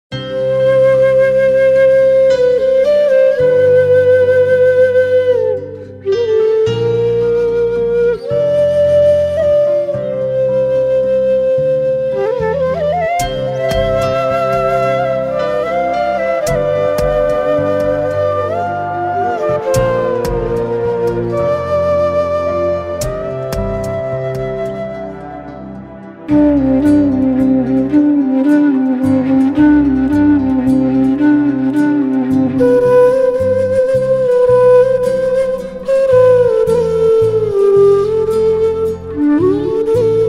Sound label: Flute & Violin Instrumental